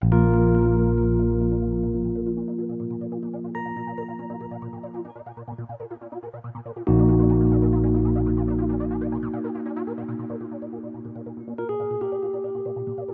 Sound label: BGM; Adventure Player; psp